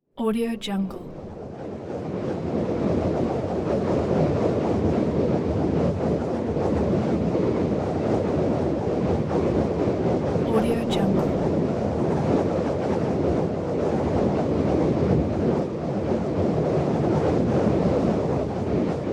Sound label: gust wind